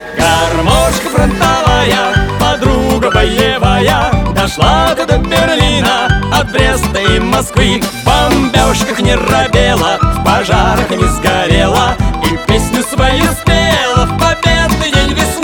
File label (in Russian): garmoshka frontovaya